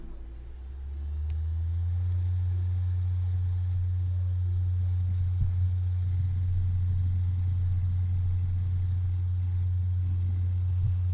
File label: Hard Drive Spin Up